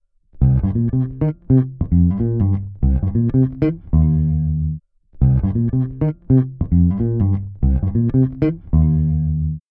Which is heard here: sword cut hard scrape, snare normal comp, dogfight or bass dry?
bass dry